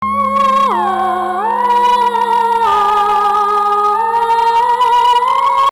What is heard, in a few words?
clipped